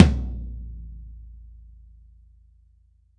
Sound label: sfx perc